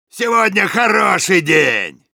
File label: cheers, ru; Soldier